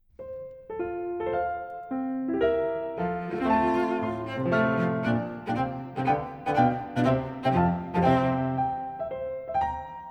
12 Variations for Cello and Piano

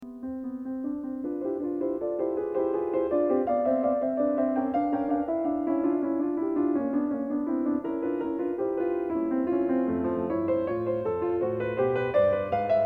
33 Piano Variations in C; Anton Diabelli

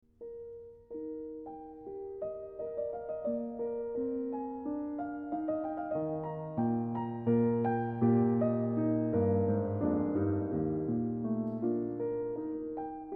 15 Piano Variations and Fugue in E flat

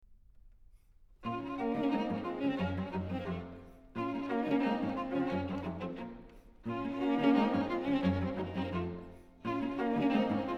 String Quartet No. 13 in B-Flat Major, Presto

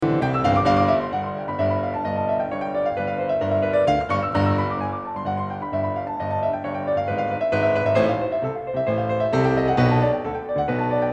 33 Piano Variations in C; Anton Diabelli

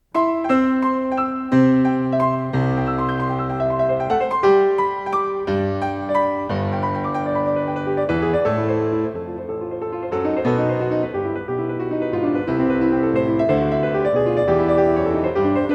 33 Piano Variations in C; Anton Diabelli

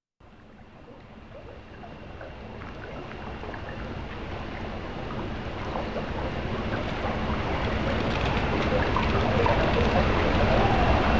Brainwave Suite alpha theta

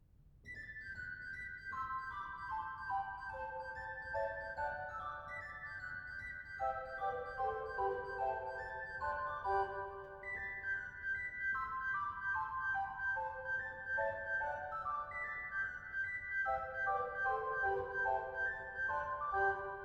Scherzo. Allegro in G Major

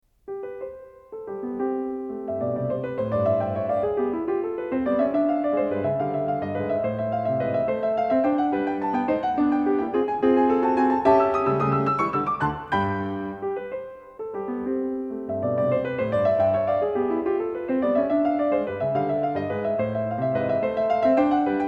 33 Piano Variations in C; Anton Diabelli

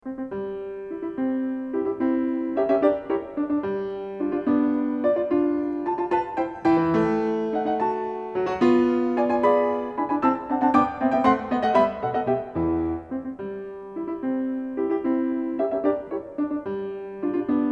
33 Piano Variations in C; Anton Diabelli

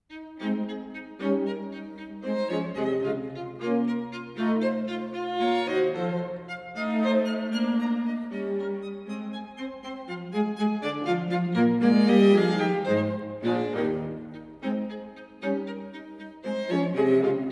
Quartett, Minuet in A-Flat Major

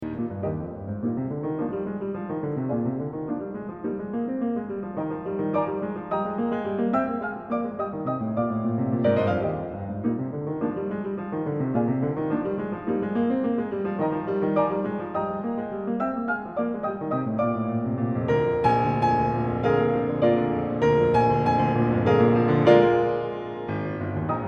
15 Piano Variations and Fugue in E flat

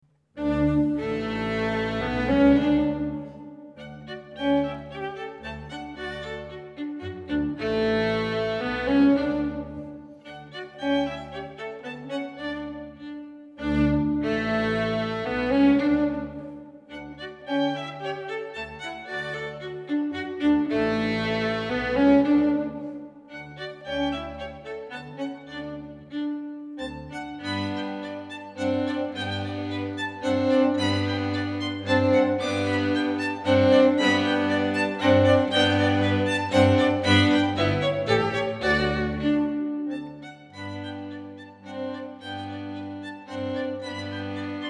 Ländler for 2 Violins And Bass, in D Major

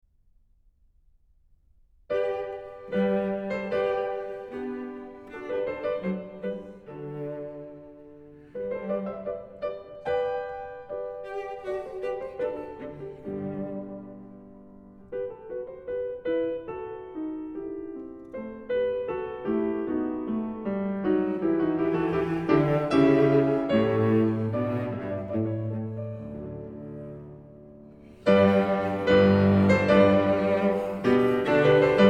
12 Variations for Cello and Piano

